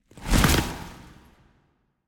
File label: landing; Smite; god Khepri